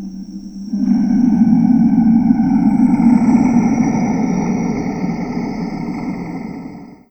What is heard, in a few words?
zombie